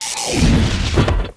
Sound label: Armed Ghost die